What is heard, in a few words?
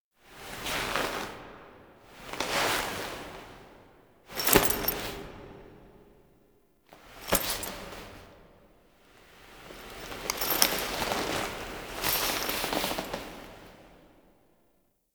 Romance Cine Foley B; Wisp; Warframe; Tau Romance Cinematics